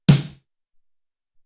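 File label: Deep Snare, Alesis SR-18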